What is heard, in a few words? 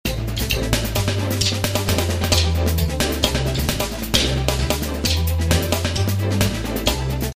Fusion 132 Dm7